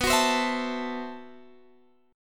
A-Minor 6th B, chord; Am6/B; strummed

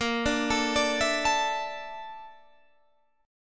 Bb Major 7th Flat 5th Bb Guitar Half Step Down

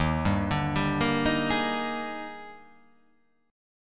Eb Major 11th, chord; EbM11; arpeggiated; JGuitar

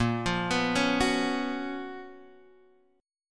Gb Major 11th Bb, chord

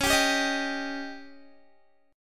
Db Suspended 2nd Flat 5th Db down Guitar; strummed

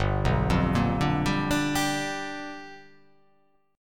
G 7th Sharp 9th G chord

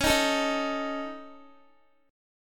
Db Suspended 2nd Sharp 5th Db Guitar; strummed; Dbsus2#5; JGuitar

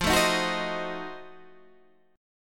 F 7th Flat 9th F down Guitar Standard; F7b9 strummed

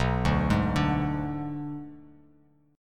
B Minor 6th B; Bm6 arpeggiated; 7,5,6,7; JGuitar

